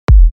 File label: Kick 51Hz G; Shytea